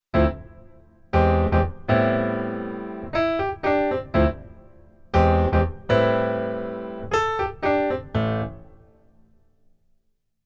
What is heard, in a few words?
Keyboard Pf Funk Pf